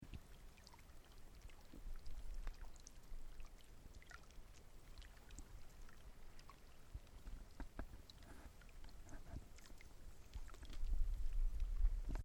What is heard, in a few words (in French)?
ruisseau